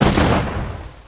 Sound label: IMPACT